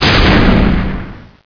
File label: Explosion Sml; XWing